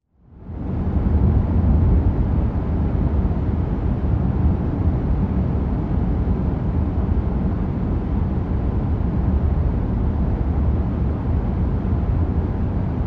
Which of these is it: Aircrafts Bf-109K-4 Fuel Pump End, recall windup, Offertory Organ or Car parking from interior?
Car parking from interior